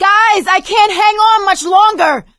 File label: ledge hang middle; Left 4 Dead 2; producer